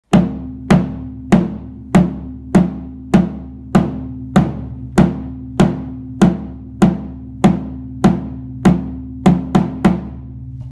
Sonido Bombo

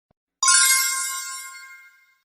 efecto de sonido de logro desbloqueado

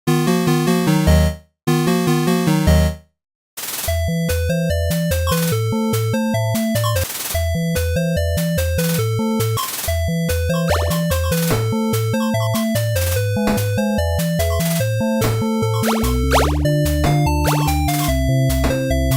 tetris sintesis de sonido

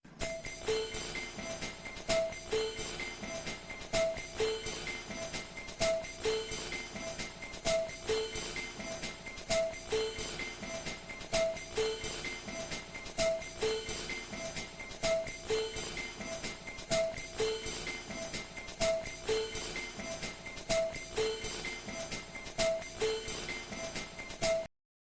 Tiempo en el Reloj Musica; 100 Mexicanos dijieron

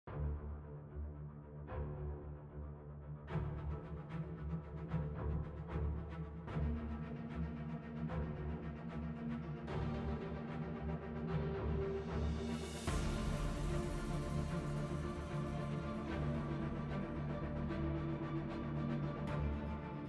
MUSICA

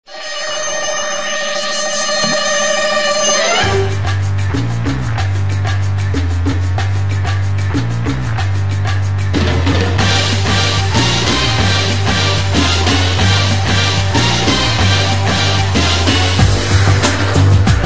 tonos; mission impossible

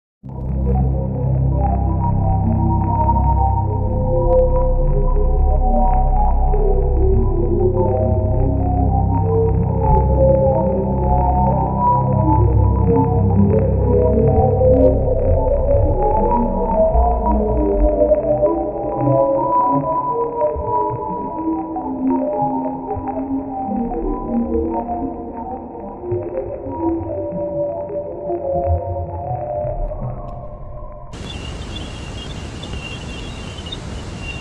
Sonidos Aterradores REALES del Espacio Profundo Así suena el Universo

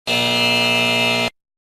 efecto de sonido incorrecto

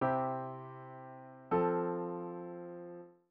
Semicadencia a la subdominante

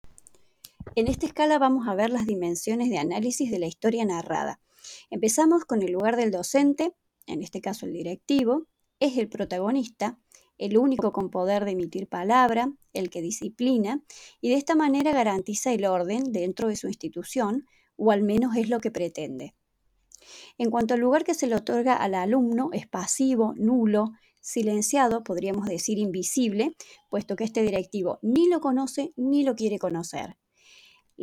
ESCALA

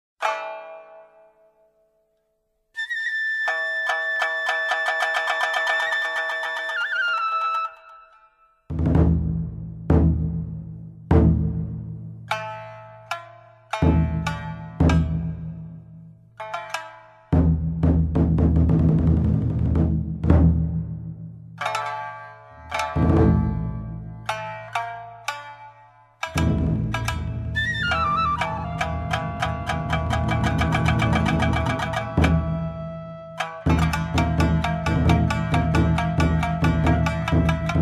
Musica Japonesa Batalla Samurai

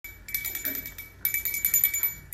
Sonido de campanas

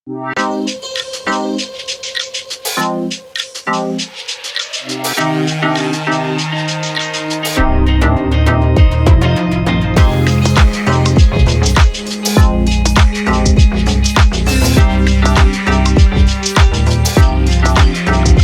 intro 30 segundos de musica para tus videos

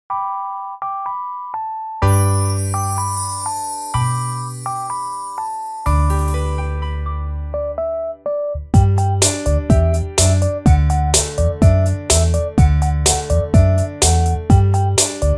karaoke sin voz cancion infantil; mariposita que esta en la cocina

